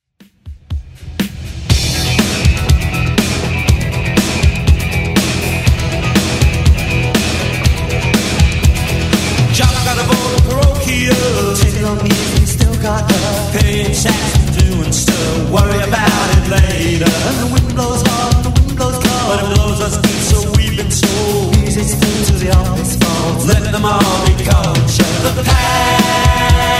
90s Rock 121bpm

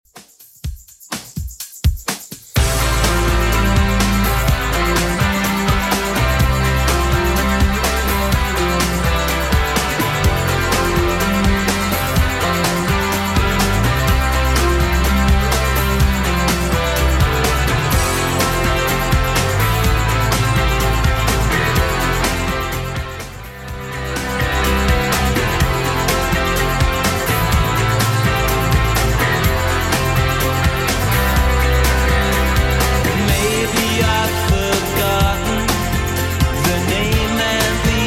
80s Redrum 125bpm

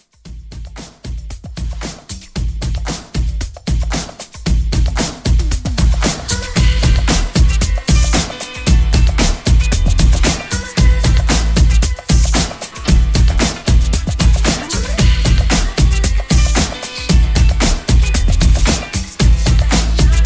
Dance Pop Re Drum 114

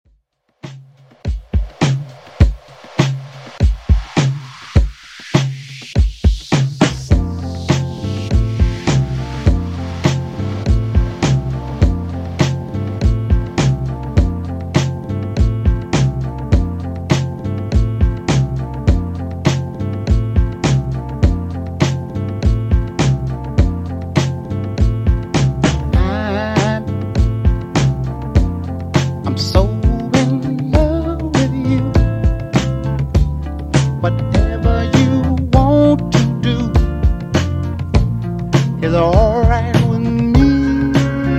Funk 102 Bpm

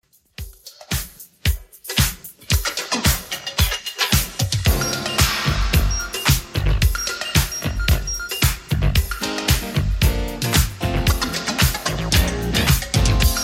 80s Redrum 112 bpm

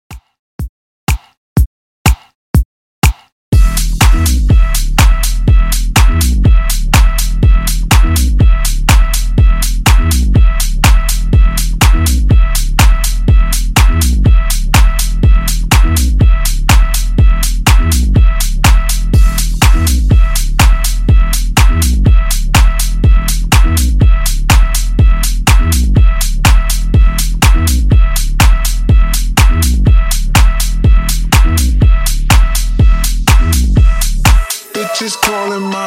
Dj Intro Outro 123 Bpm

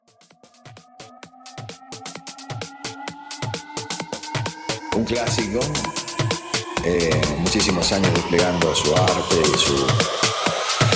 Reggae Fusion House 130 Bpm

